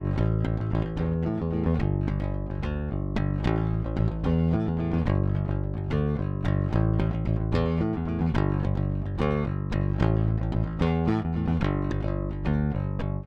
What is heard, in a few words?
bass pick bypass